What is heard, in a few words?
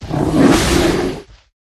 White Bear